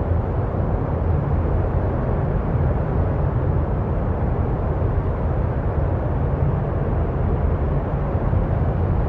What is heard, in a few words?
Amb Bunker Int